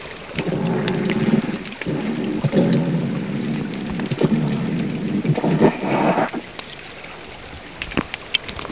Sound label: seal sounds